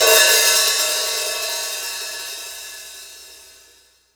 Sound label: Hi Hat Half Open, Death Metal